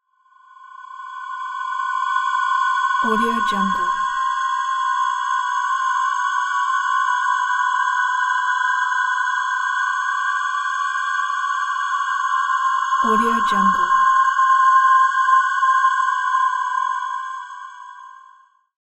modular ui hollogram pad; soundmorph

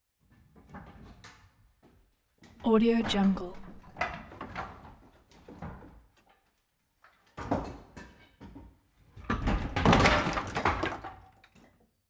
wood heavy debris fall

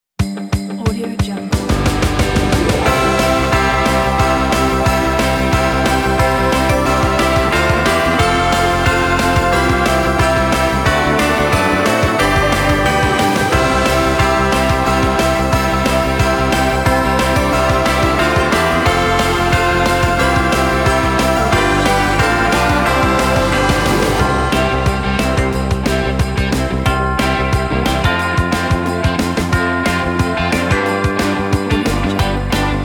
jingle bells lite rock

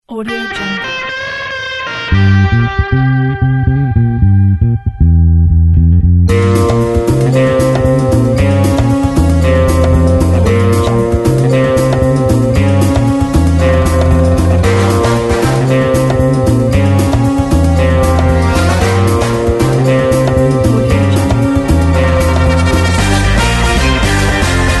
spy agent

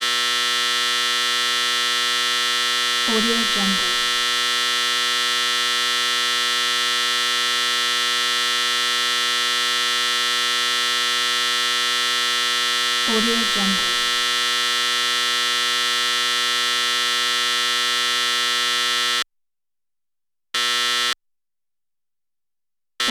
alarm buzzer

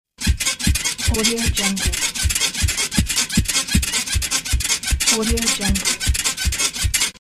sex on the bed

